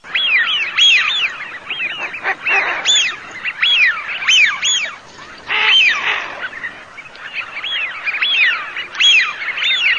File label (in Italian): FISCHIONI